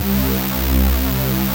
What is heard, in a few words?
fat attack; Pro-Rec - Synthsonic